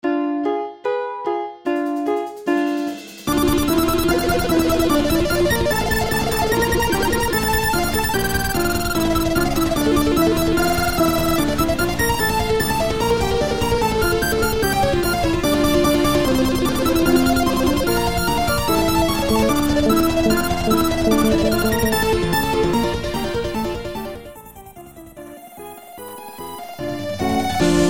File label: guitar